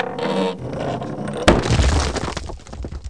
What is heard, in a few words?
RK DROP; REDNECK; RAMPAGER